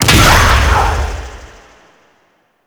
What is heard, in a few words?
Scarab Swarm Burst; Warframe; Mummy